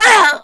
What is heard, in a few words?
pain short; smoker